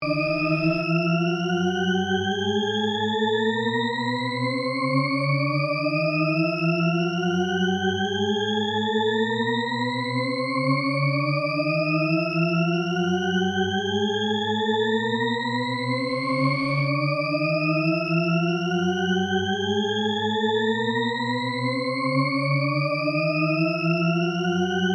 Shepard Tone Up, Synth; Synth Sound Effects; Synths (Sound Effects:Musical); BlastWave

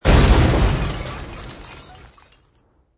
Explosion With Glass Shatter; Bomb Sound Effects

